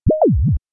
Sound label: hula hoops lock; LG X Screen